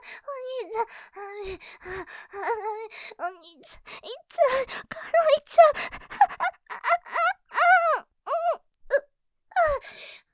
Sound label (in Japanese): 喘ぎ 絶頂; お兄ちゃんダメぇ、いっちゃう；；; ループで楽しむ！シェフの気まぐれ抜き抜きコース; モノラル